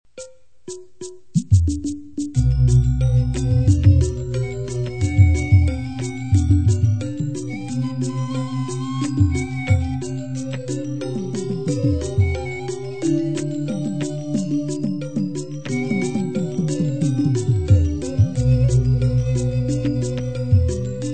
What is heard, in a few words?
degung